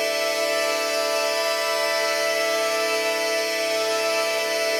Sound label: Pad Loop D, shimmer and sparkle